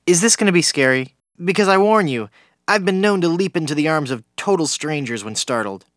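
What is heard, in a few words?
attack; NWNDS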